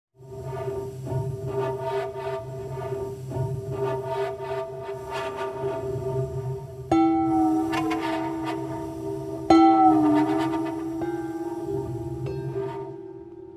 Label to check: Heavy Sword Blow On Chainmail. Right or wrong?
Wrong